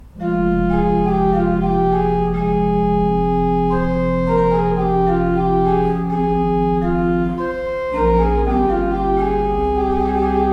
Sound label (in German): Van Rossum 10 II P, orgel